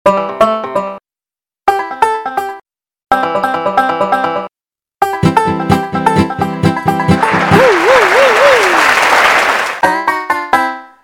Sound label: Banjo; LG; U8110